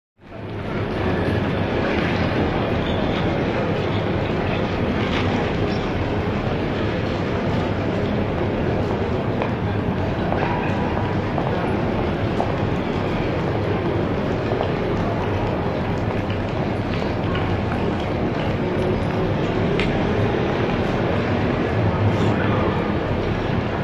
Train Station, Heavy Activity; London Station; Euston Railway Station London. General Background Atmospheres Of Busy Activity With Background Station Effects.; Close Turnstile Ratchet At Subway